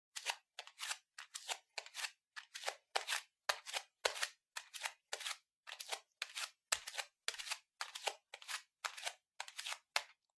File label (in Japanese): フローリングを裸足で歩く